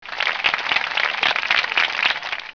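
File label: Quick Clap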